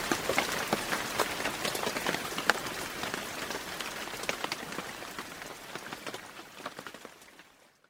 sleet, weather

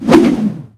tentakle, combat; larkan sound improvements

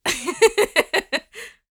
female giggle; CosmicRage; CosmicRageSounds; socials

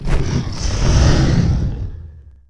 attack; CosmicRage; combat; creatures; dragon

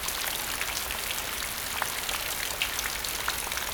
rain puddle

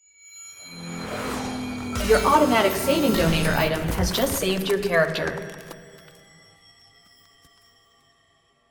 autosave; Cosmic Rage

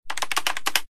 keyboard; CosmicRage; ships computer

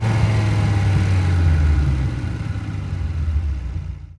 stop, highway; CosmicRage

